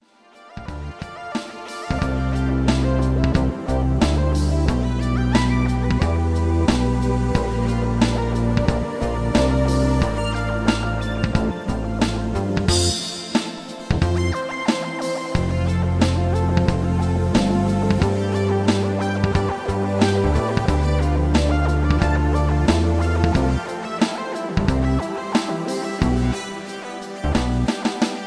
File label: Chill Loop